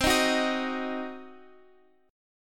C Diminished C, Guitar Open F; strummed; JGuitar

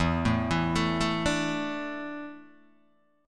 E 7th Suspended 4th, Guitar, DADGAD

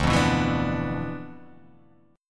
D Minor 6th Add 9th D

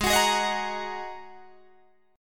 Ab 7th Suspended 2nd down Guitar DADGAD

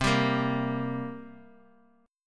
G Minor Double Flat 5th, C

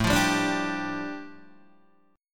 A 7th Suspended 4th Sharp 5th A, Guitar Open D

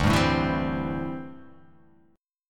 D 7th Suspended 2nd Suspended 4th

